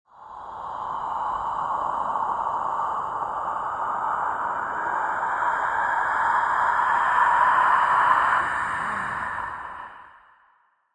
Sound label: Man made ghastly creepy wind sound with some processing; Evil; Horror